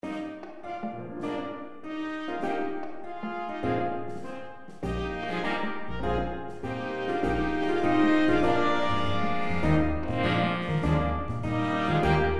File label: bigband